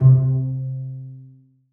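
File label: basses pizzicato c3; Sonatina Symphonic Orchestra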